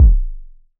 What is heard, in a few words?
KCK, Roland SH-09 synth drums